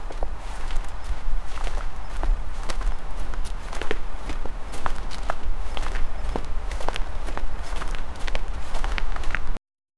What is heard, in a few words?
footsteps at night slow